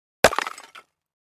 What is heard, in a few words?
Clay Chimney Vent Hit, concrete & brick